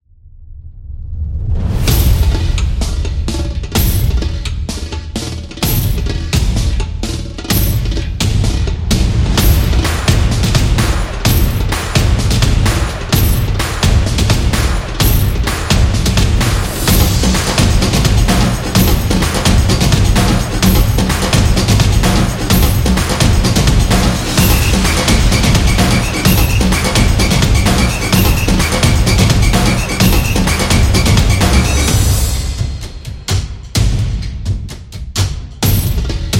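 This Is Sport Action, Sport percussion background music created for action, competition; sports beat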